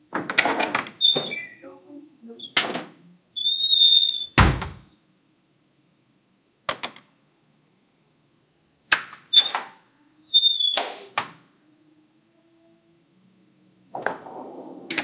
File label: doors, game; roblox